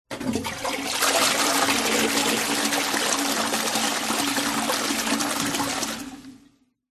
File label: household toilet flush close small room; zapsplat